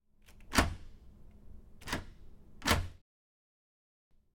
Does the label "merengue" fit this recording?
No